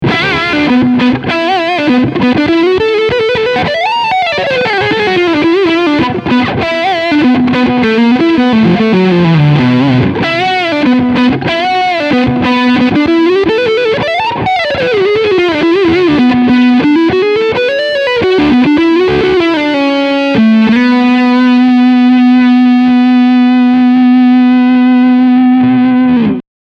Leadsound Hals Pickup

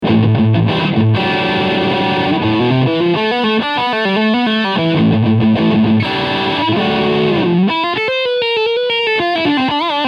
Jackson JS32Q DKA Dinky E Gitarre Overdrive Steg Pickup

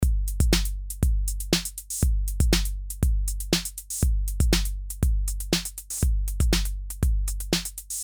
808 kobalt

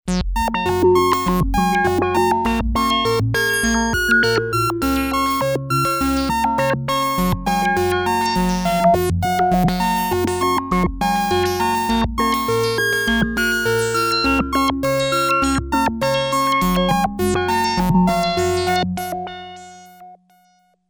Random LFO auf Filter; P600 gligli

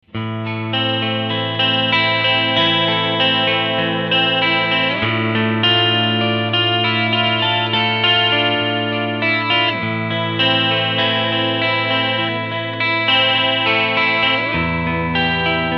Hals Pickup als Singlecoil clean

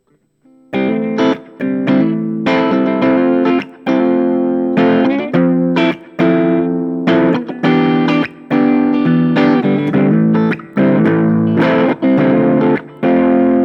Booster angezerrter Amp

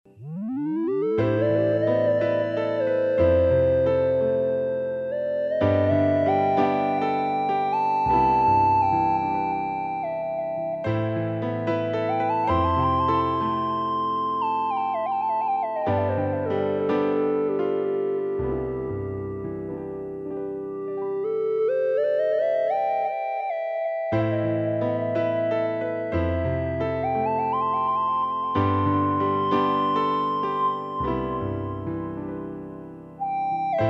moog theremini frolicking plus piano mit pc min blues

